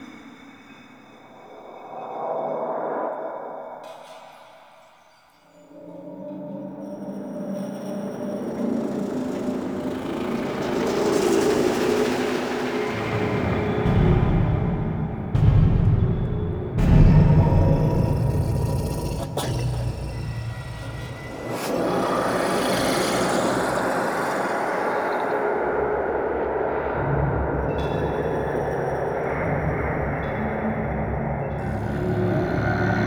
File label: Hub Mall Stereo Loop, Ambience; Warframe; Lotus Eaters; Quests